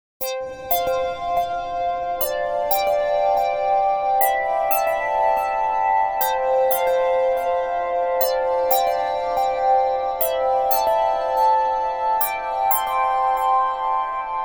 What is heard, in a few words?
Melody; Melody using fourths